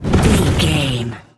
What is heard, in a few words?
fireball blast projectile spell